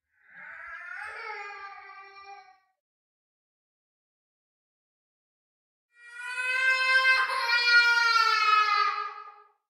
child's soul

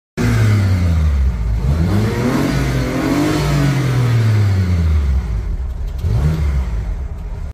BMW i8; موتور

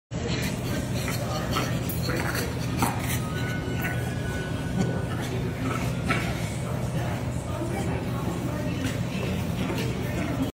Bonobo

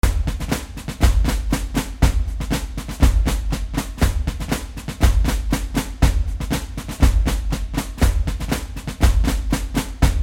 marching drums drum solo

